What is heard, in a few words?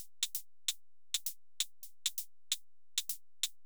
hihat; Music Store Sound Service; Einstein